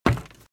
Footsteps - Wood - Creaky - Run - Right

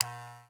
Electronic, Latch, click, heavy, clippers, on; Eiravaein Works; Vaeyan IV

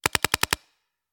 Paintball Gun; Sound Ideas; Guns

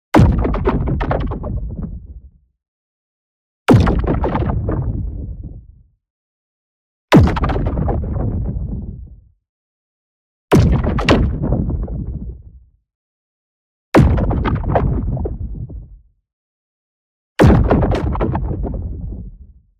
EXPLOSION LARGE Chunky Blast; boom library; Matter

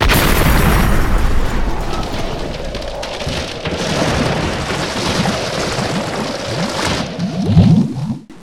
sink; Quentin C's Gameroom

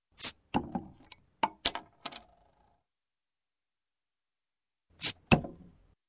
Bottle, Beer Open With Opener; Sound Ideas 2000 Series

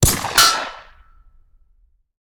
Bullet Impact; Sound Ideas; Guns

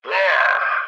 hit yell; Blind Drive

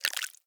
Specimen Liquid Drop; Medical; Blastwave

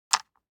DICE on Wooden Tray, Grab, Standard, 3 Three Dice; Samples; ArticulatedSounds; DICE Sfx Party (Extended)

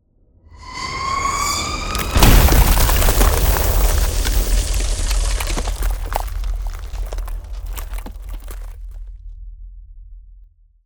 Ice Spell Damage Direct Frostbolt; Arctic Aura

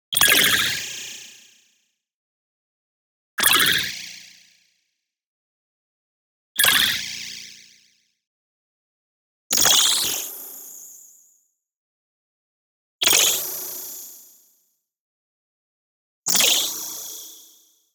BLING Particles UI; boom library; Anime Essentials

